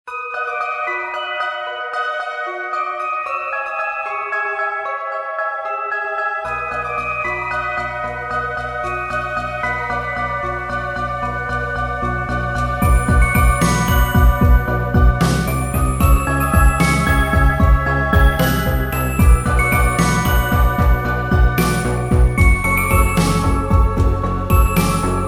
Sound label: Menu; Bad Ice-Cream